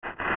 Crawl; Baby Dodongo; zelda; OOT

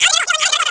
Talk

